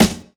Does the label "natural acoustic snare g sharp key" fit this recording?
Yes